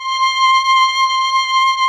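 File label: touch strings C5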